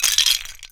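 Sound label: debris small; LEGO Star Wars Force Awakens; Junkbot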